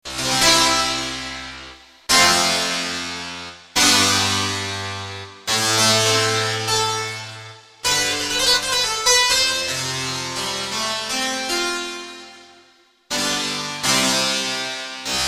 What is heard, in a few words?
cembalo; v-synth